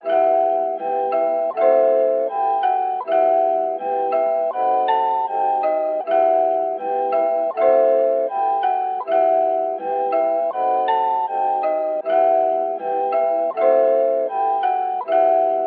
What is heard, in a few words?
160 BPM E Min Main Melody, melody loop stem; Trap; Cymatics; 2022 Melody Collection; Trap Pt 2; Rebel